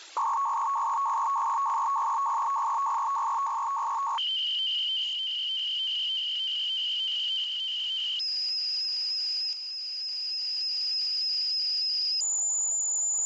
CIS SELCALL 4 channel scan, CIS SELCALL FSK 150 Bd / 200 Hz; CIS SELCALL scanning 4 channels with 2 kHz separation; FSK-systems